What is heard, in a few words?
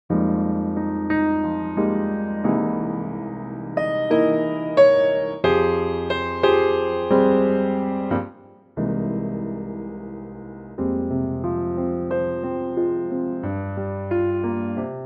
Fm Piano; key - Fm; vocal range - G to Bb